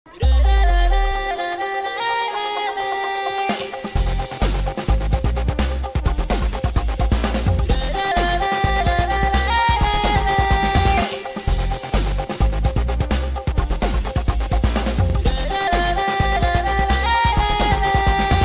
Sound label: malayalam ringtone